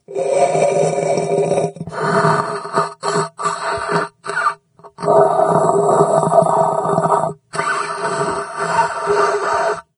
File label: rebreather, hazmat zombie; doom3